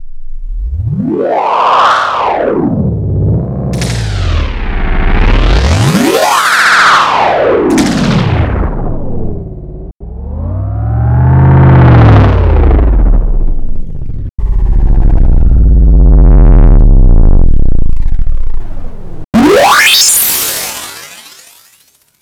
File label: Weird Hats